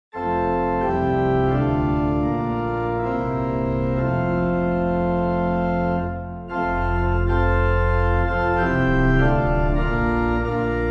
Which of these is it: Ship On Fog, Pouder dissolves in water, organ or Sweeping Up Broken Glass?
organ